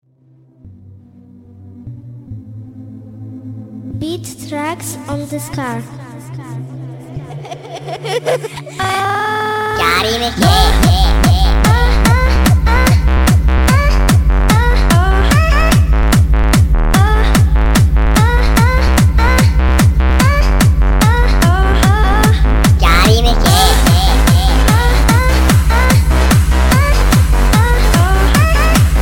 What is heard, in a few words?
Ahh Ahhh Ahhhhh